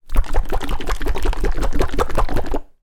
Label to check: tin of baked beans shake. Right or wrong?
Right